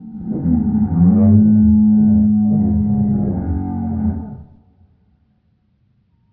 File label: pray angry; Barotraumatic; Praywhale